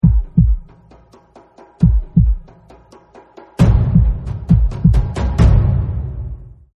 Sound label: loading round